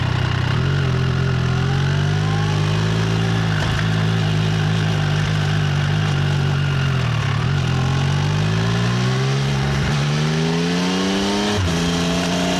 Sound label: Triumph Trident 800; ielas motocikls